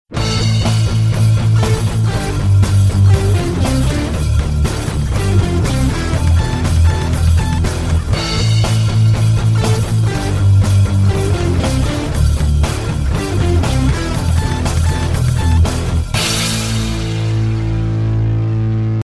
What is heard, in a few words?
samsung tune rock remix ringtone